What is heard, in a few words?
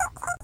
string; tuxpaint; magic